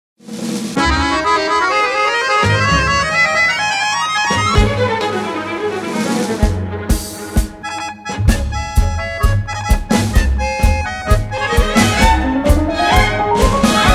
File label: TG Powerful Tango; Tanzmusik